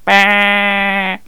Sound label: Sheep